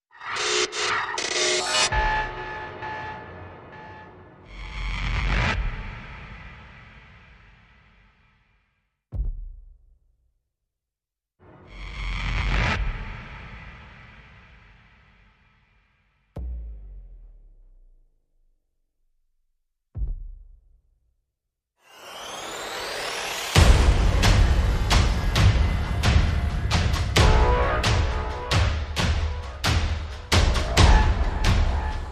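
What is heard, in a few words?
Percussion and FX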